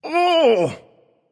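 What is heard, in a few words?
non verbal ouch, voice; cernunnos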